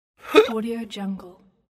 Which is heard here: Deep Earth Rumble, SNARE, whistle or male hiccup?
male hiccup